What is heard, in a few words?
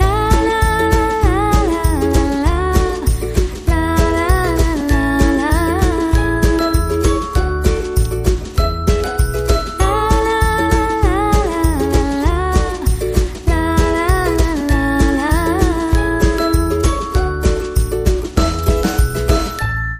Intro Vocals